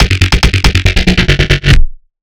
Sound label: AMOGUS BASS